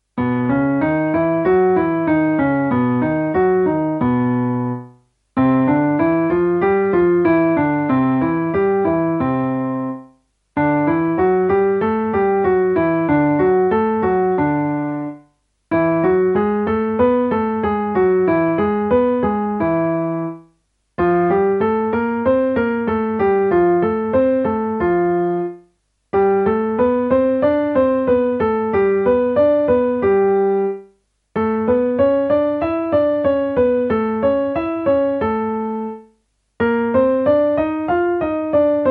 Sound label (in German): Dur Lagen Uebung